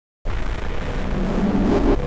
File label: Reload; svencoop